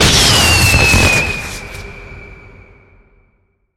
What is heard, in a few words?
firewerks launch; atmosphere